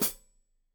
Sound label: Hat Foot, Hi-Hat, Cymbals Stereo